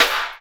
Snare, Percussion Hits, Snares

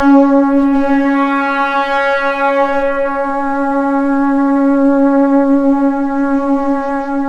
C#, Synclav FM; Big Fish Audio; SPACE2

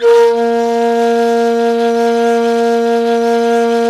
NYE FLUTE; World Combo

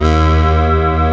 vax synth; East West; Global Sounds

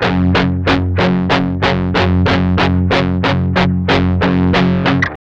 Guitars, MED ROCK; Spectrasonics; Hans Zimmer Guitars VOL-1; 102-MED ROCK

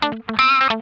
HARD PICK, Funk Guitar; 90 s Sample CDs; Zero G

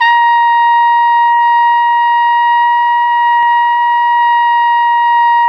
Flugelhorn; Roland L-CDX-03 Disk 2

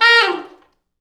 T Sax falls, Horns Sax

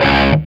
D 3, Soldano rock guitar; Spectrasonics; Hans Zimmer Guitars VOL-1

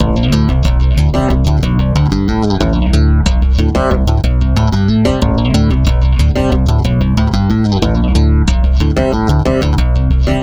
Stick Loop, Stick Bass

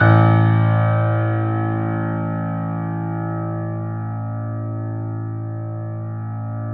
Yamaha C7, 3D Pianos; E-MU Producer Series Vol. 5

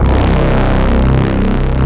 BRASS C0; AKAI S-Series CD-ROM Sound Library VOL-2

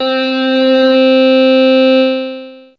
Organ C4, Fairlight CMI IIe Analog

